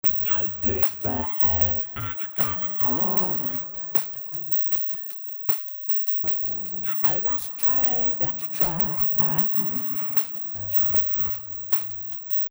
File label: Harmonic Rotate